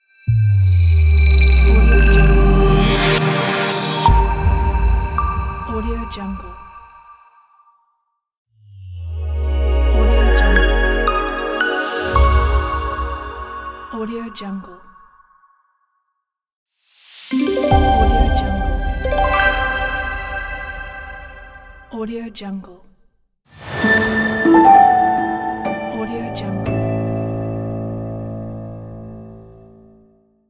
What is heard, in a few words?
logo sting pack